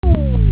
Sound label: nudge Loop, slots